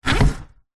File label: household window modern slide down close; zapsplat